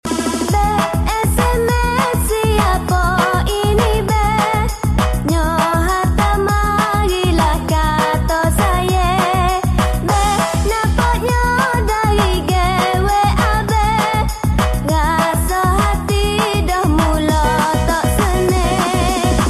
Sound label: Beautifull Voice Sms